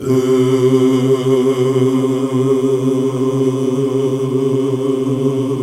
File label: Gosp. Oooh; Sound & Vision - Gigapack II CD 1 (Roland); HAL Gosp. Oooh 2